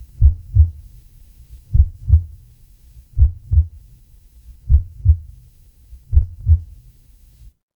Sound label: horror heartbeat